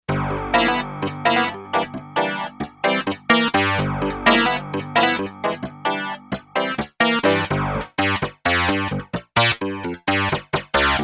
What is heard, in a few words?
Funky Clavi, Yamaha TX802; Performances; Factory Patches